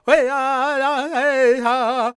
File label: C# MIN, World Voices, LAKOTA